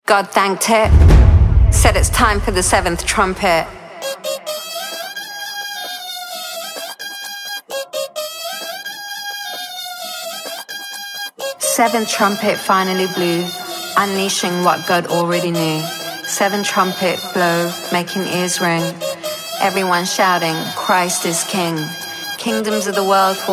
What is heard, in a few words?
TRUMPET